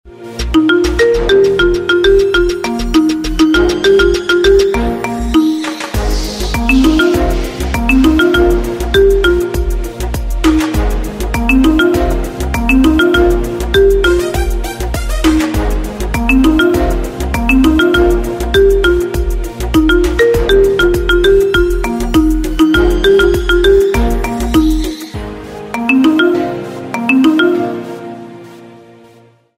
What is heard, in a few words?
Marimba Mix; рингтон